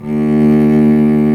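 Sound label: STRINGS SOLO, Lrg Ensemble; Roland L-CD702; Combos 1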